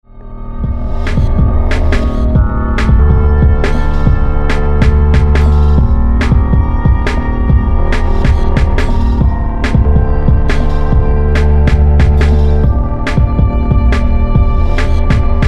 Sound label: Sinister EDM